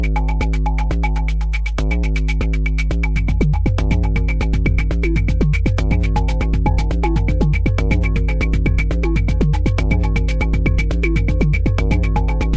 reaktor wanderbeat, synth and electronic loops

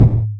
Syn Dr, Overloaded Synthetic Drums; style kits

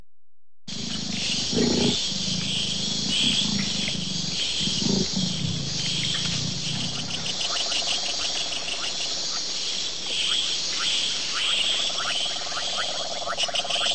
nature

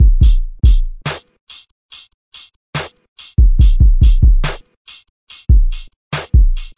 Dark Kick Hat Snare, drum loops and breaks; 142 bpm; 141 to 160 bpm

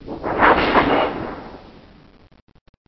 Thunder Metal; Cheesy Lo-Fi Sound Effects

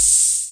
cym, Ace Tone Rhythm Ace FR-1

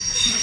subway sound; Subway Sound Construction Kit

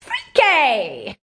freaky squeaky, female; MISC and SPOKEN WORD

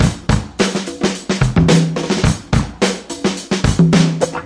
107 bpm 70s funk wakka, drum loops and breaks

